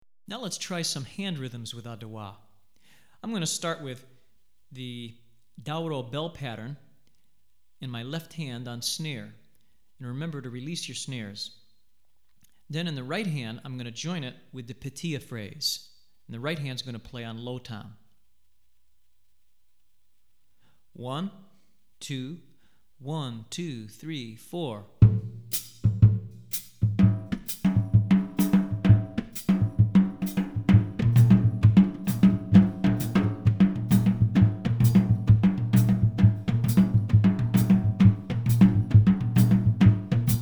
Adowa, Hand Example, West African Rhythms For Drumset; Hartigan